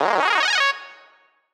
Leapy Jumpy, tones

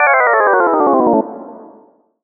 Mission Incoming, tones

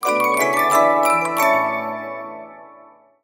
Music Box Logo

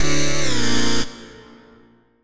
Level down

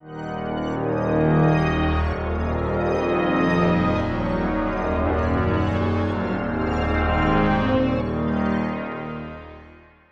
Atmos Theme

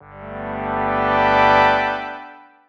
Wake up

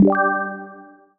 Message Received

